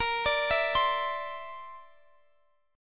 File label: D 7th Suspended 2nd Sharp 5th A sharp